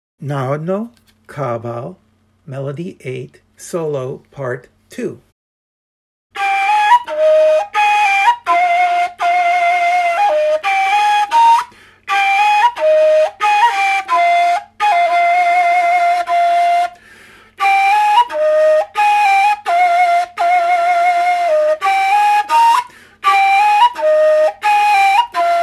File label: Narodno kaval melody solo